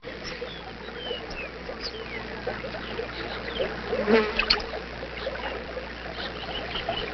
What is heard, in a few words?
swamp